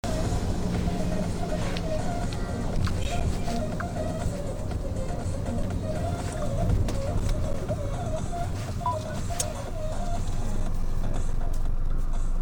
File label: taxi ride soundscape